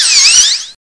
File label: DRILL; PUZMANIA